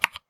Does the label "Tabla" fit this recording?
No